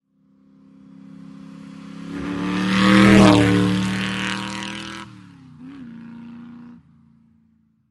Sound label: Motorcycle By